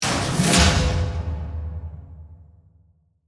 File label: Hit Metallic Reverb, Reverse, Impact; Production, Element, Imaging, Accent, Transition